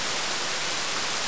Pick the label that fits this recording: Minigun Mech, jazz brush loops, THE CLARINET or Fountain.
Fountain